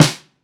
snap

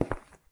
footstep

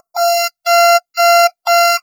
alarm

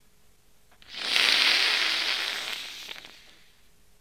lava hiss

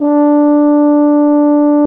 tuba loopnote

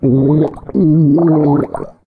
drown, reptilian player

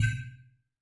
item respawn countdown

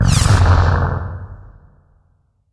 spawn

